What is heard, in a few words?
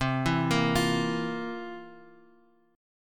C 11th C down Guitar Half Step Down